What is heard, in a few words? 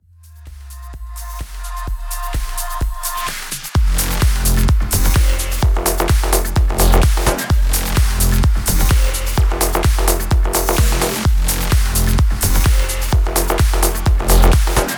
elliptical kit full mix 128bpm